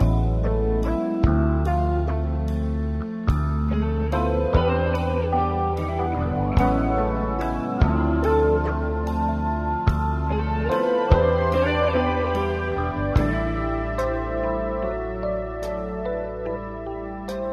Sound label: keys, Loops, Sad